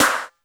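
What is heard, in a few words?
Snare Pop Shot, Alesis D4 drum machine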